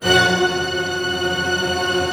STR TRM C, STRING TREM; Sound On Sound - Peter Siedleczek's Orchestra

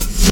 Reverse Snare; Zero-G - Soundclash Nu Dance Electro; Acidized Wavs; Construction Kits; Smooth Circuitry

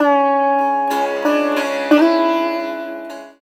SITAR; Spectrasonics; Heart of Asia

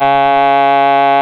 REED C#; Big Fish Audio; Synclav FM

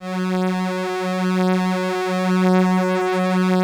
LAMBDA, String Machines

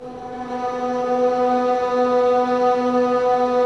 STR VLN SD, Vlns Bow FX, Sord; Roland - String Master Series

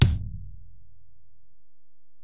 Ac BD, Alesis DM-PRO; 300 Drum Machines

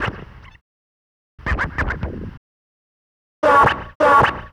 Wiggle Disc, Urban Grooves

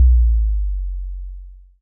Sub, drum

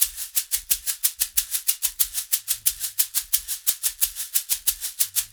shak, percussion loops

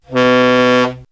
Horn; X-Static Goldmine

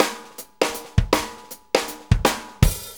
Shuffle Fill; Shuffles; Fills; Drum Tools

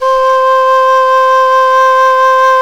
SULING VIB, Exotic Winds

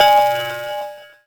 Msx Conga, Percussion Hits

